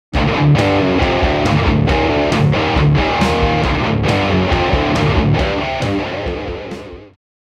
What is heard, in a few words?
Met Drums